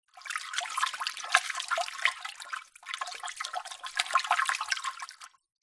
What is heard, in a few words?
nature water surface agitate swirl finger gentle plastic tub